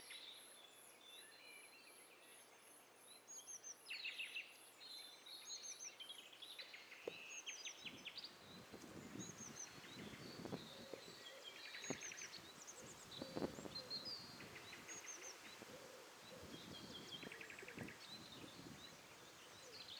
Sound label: STEREO BIRD COCKTAIL PARTY